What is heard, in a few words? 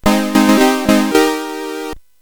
Loopz, CSG8580R5